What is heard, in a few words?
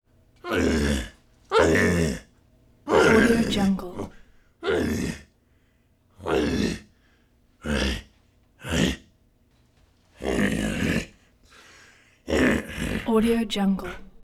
creature grunts questioning confused frustrated